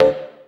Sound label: dance chord